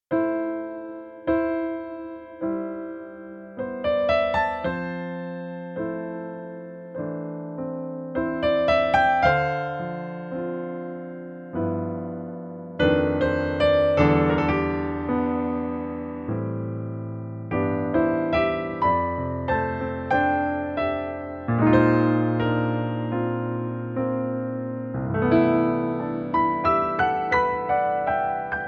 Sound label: stagepianos yamaha grandpiano